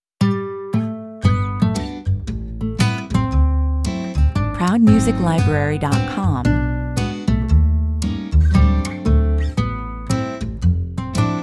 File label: swinging down the avenue (guitar mix) 115 bpm